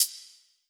Closed Hat, 808; Slate; Vintage Drum Machines Volume 2 808 Edition

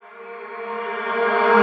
vocal nitro Ab; SD AURORA Sample Pack; Slate

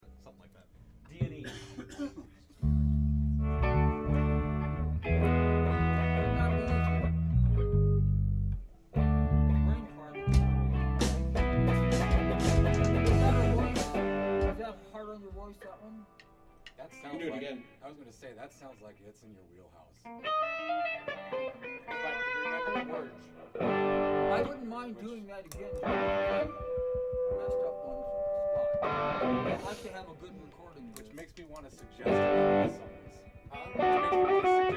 Room Mic

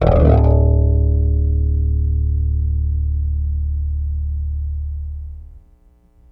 Bass Scrape D, Electric Bass One Shots; Slate Digital BASSHOLE Sample Pack

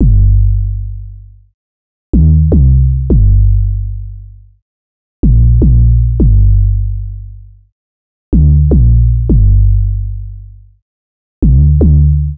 808 loop 155 Gm; Slate Digital BASSHOLE Sample Pack; 808 Loops

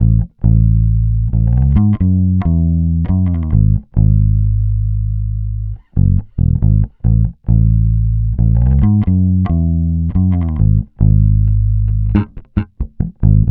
Bass loop 68 C#m, Electric Bass Loops Direct; Slate Digital BASSHOLE Sample Pack

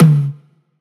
tom, Drum One Shots; Slate; SD MIDNIGHT Sample Pack; Toms; blue

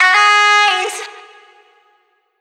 phrase F#, Vocal; Slate Digital Omnivox Vocal Sample Pack

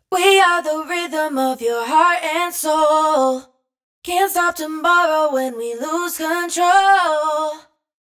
hook 122 Cm wet, Vocal; Slate Digital Omnivox Vocal Sample Pack

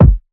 kick punch, Hip-Hop; Drum One Shots; SD CAICOS Hip-Hop Sample Pack; Slate

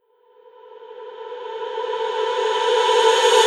reverse, vocal sample

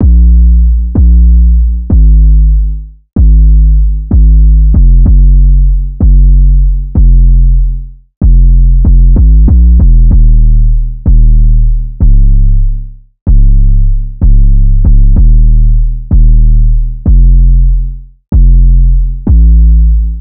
Synth loop 95 Bbm, Synth Bass Loops; Slate Digital BASSHOLE Sample Pack

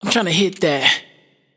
hook Hit That wet, Vocal; Slate Digital Omnivox Vocal Sample Pack

